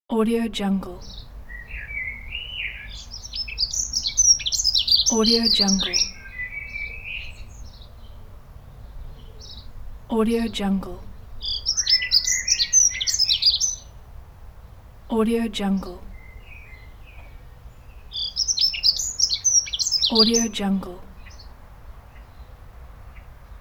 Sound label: birds and ambience from the UK